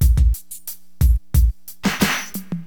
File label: Drums loop, HipHop; LiveAct Pool 1